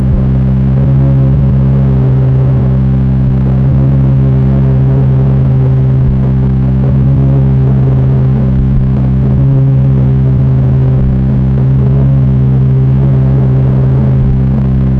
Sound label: vibe noise atmospheric; experimental